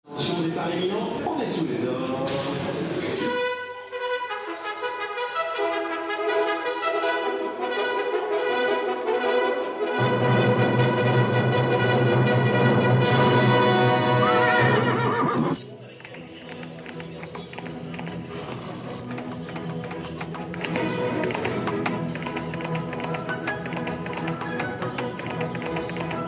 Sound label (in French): musique cuite ouverture guillaume tell; Ouverture de Guillaume Tell